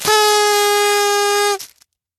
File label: Horn